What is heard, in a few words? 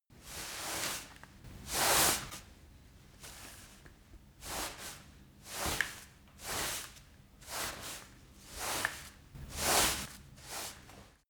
broom sweep sequence unsteady